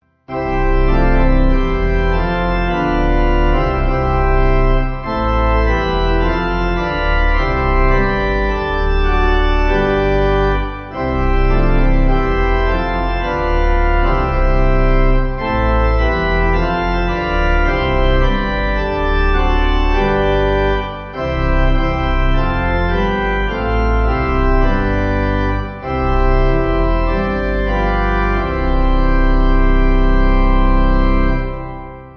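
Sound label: Pipe Organ, Music Backing Files for Churches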